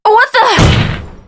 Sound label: faceplant; blake